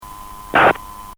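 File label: Noise JFK Departure Control; this noise is on the JFK Departure Control Tape; This is the start of the 8 second sequence that ends with the death of the FDR. The plane crashes 10 seconds after that.; flight587; FAA Tapes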